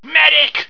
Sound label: trooper pain